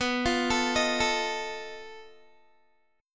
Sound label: B 7th Flat 5th B Guitar; B7b5; arpeggiated